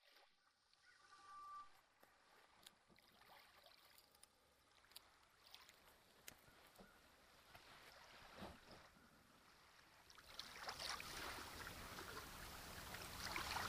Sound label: Madeline Island Quiet Waves